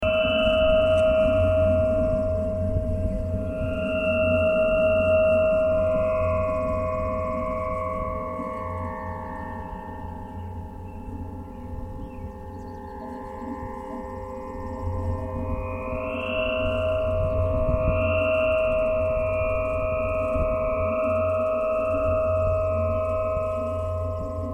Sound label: pvc harp